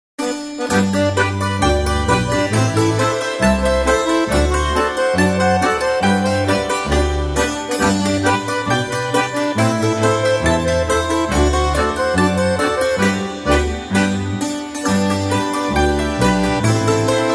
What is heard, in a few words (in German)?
Polka